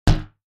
Boing Ball Rubbery; boings / twangs / snaps

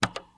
Computer Keystroke, keystrokes

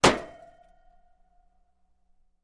Metal Impacts Light, pipes

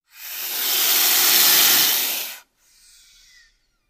Boa, Albino Hiss. Close Perspective.

